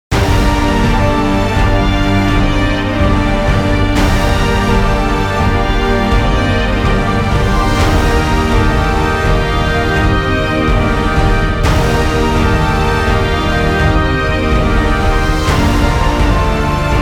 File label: no vocals